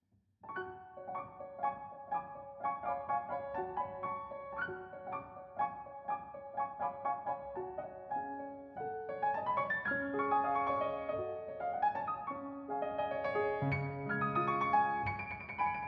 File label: Piano; Pensees fugitives Op. 11 No. 4 Allegretto